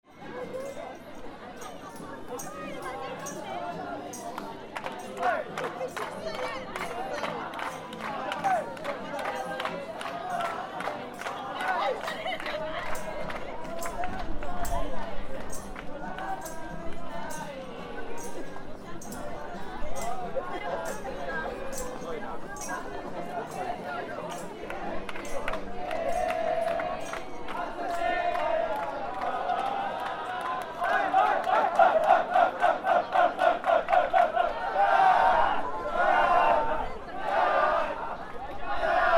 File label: graduation